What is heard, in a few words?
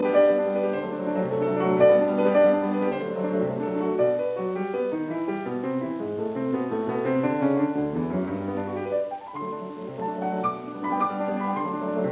Piano Sonata in C major Allegro assai; Op 2 No 3; Claudio Arrau